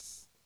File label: HH, Dance Mega Drums; Best Service; HH-HIP